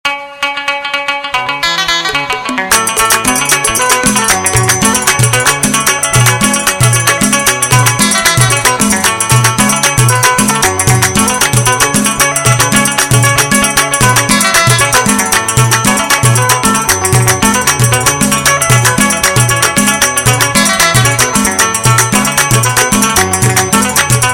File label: rolling down ringtone; samsung ringtones